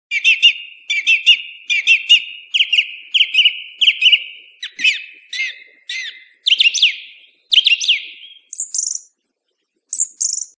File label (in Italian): Tordo